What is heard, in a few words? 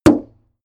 metal impact bright muffled